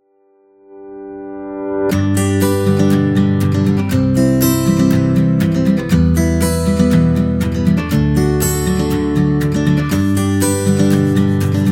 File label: Inspired Guitar